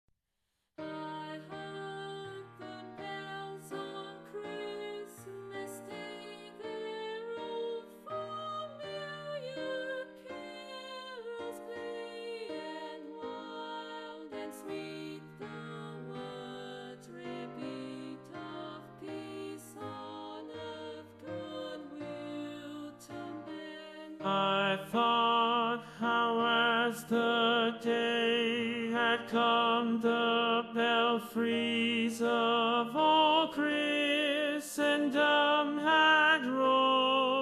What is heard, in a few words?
Tenor